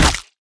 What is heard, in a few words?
melee attack impact; weapons; dota; creep